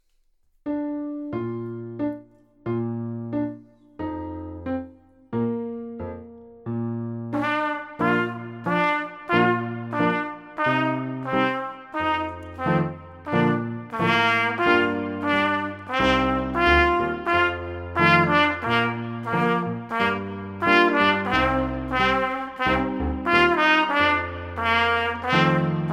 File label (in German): Trompetenfibel Vogelhochzeit